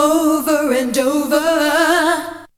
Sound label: VOC